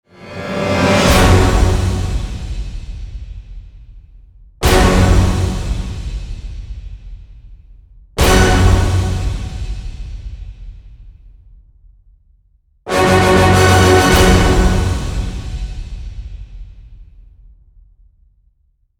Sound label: Orchestral End Hits (F#); Epic Tools vol. 1